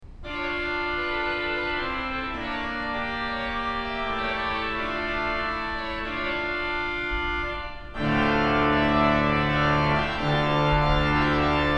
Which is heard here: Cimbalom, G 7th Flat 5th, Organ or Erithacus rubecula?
Organ